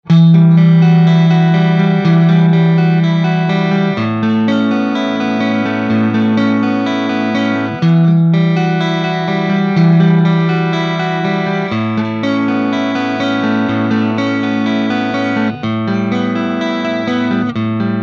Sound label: Clean Neck